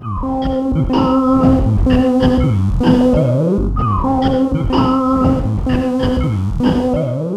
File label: C4, Synth Line; NiceBeats